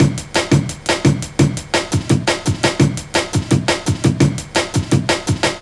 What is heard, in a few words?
LOOP, Jungle